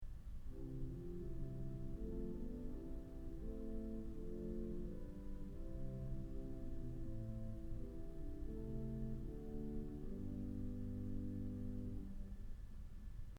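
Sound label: Swell Flauto; Kellogg Auditorium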